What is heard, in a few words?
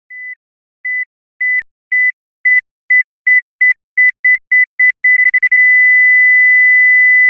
ticking bomb